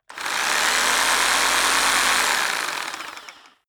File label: rock drill hammer rev very long; maschinen; bohrer; soundpool